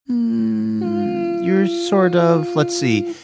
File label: humming while pondering